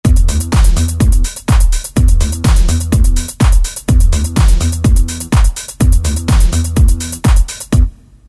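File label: beats; E-mu PK-6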